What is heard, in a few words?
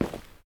Footsteps, Human, Tile, Walk; backrooms 2d android ios online windows gamerip